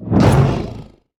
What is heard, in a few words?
heroes bonehart basic attack cast